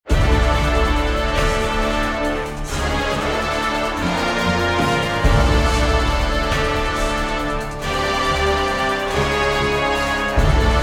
Battle Loop; Hell Warders; BlackAlley

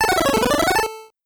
Map Enter Stage; bat boy; ps4 ps5 switch windows xbox one xbox series xs

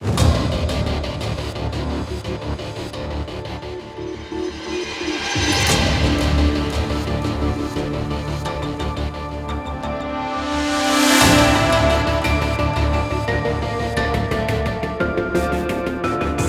bgmusic exploration; Iron Marines

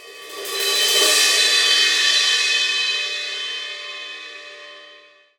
Hi Swell

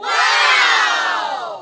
reaction; birthday party bash; wii

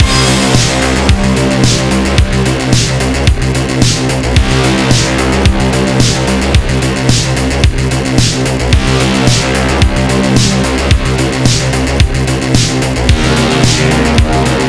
Level loop; Level E part 2

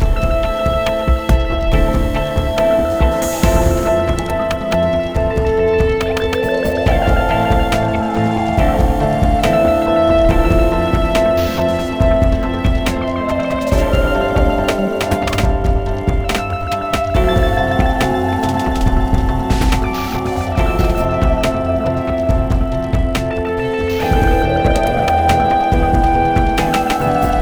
Trainride Stationary Medium; rive wreck hack die retry; ps4

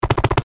Fast; F.A.M.P.S

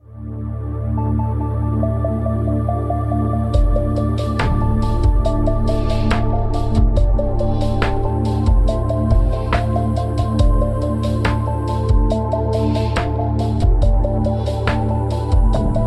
Bank Offer No HH Fills; deal or no deal; wii

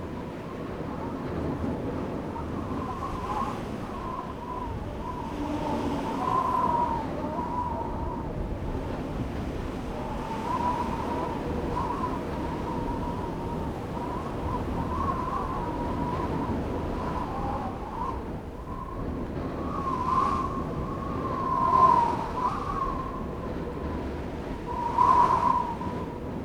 Gloomy Manor Outdoor Ambience; game rip; luigis mansion dark moon; 3ds switch

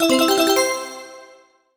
MUSIC EFFECT Bell Voice Positive (stereo); startide macos switch windows gamerip 2017

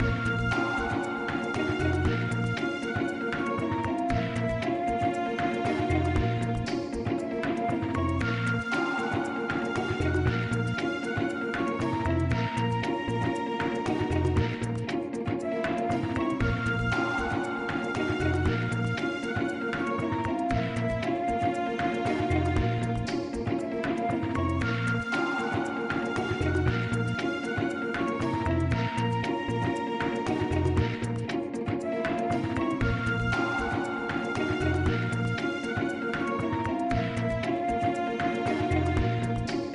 The Underwater Area

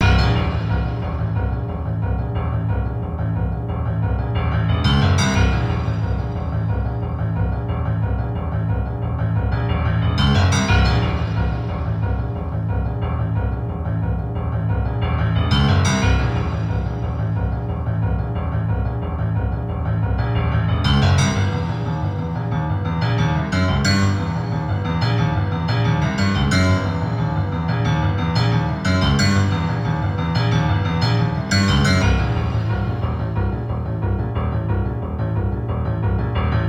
Ambiance Mono Run Piano

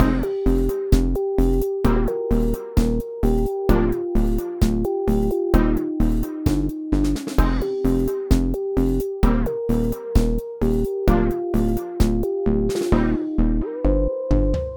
Lose Loop; super ufo fighter

